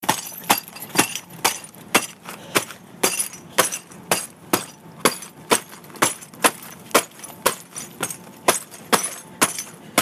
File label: Plates